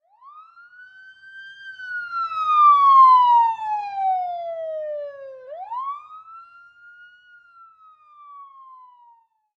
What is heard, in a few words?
Emergency Police Siren Pass By; Sirens (Sound Effects:Electronics)